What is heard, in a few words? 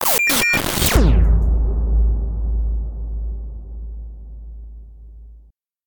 impact